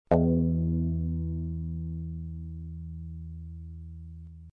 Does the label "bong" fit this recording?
Yes